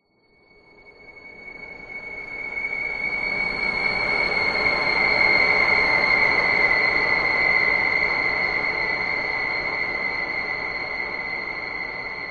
horror swell atmopshere dark sinister high pitched shrill